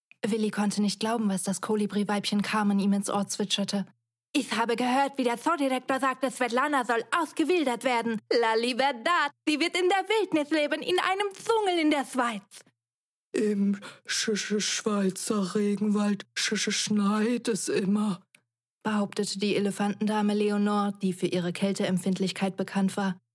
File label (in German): Tierstimmen